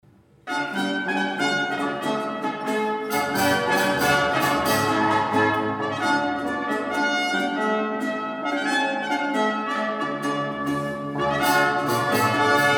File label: Norwegian Wind Ensemble